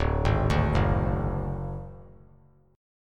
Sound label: D Major Flat 5th D, chord; arpeggiated; JGuitar